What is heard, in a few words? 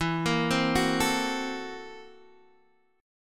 F sharp 7th Sharp 9th Flat 5th E